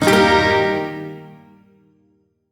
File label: Shawzin Blues Chord; Warframe; Duviri